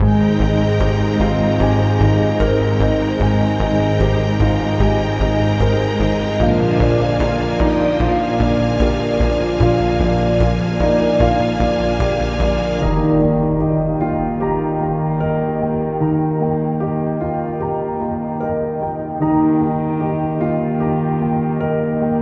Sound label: dramatic; By Burak Sound Bank